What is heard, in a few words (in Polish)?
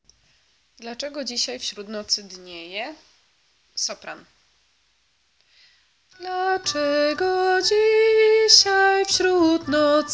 Dlaczego dzisiaj sopran; Boze Narodzenie